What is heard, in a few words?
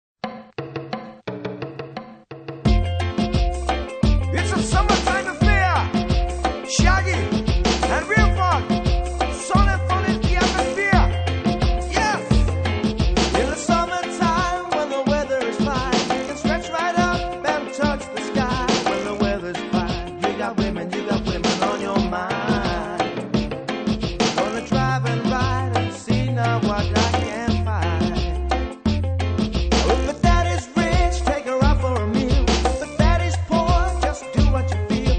Reggae